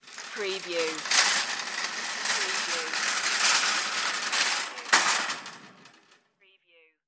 shopping cart

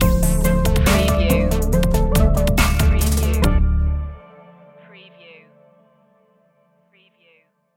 so hip stinger

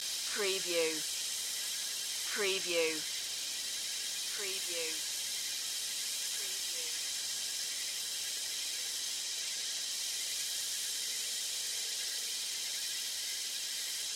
Ambience Jungle Day Loop; Nature Sounds / Ambiences

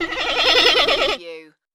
creature talk

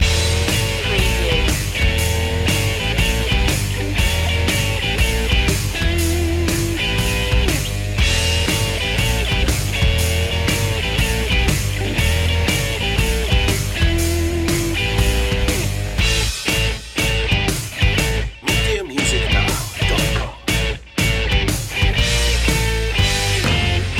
monster truck loop

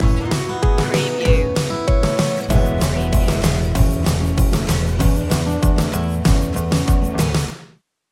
midnight shuffle stinger

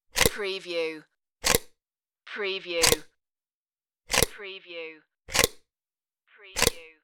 outdoor socket

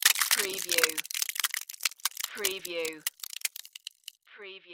Ice Crack Long; Sound Effects Bible

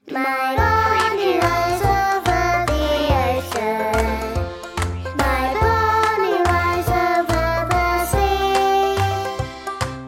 children vocals; my bonnie lies over the ocean

